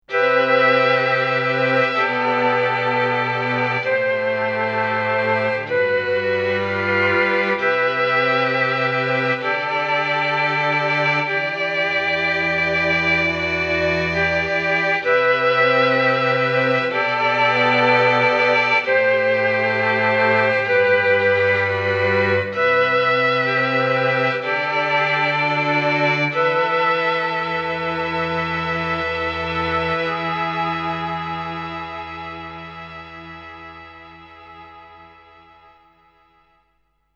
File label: Strings Tape; VIVO S10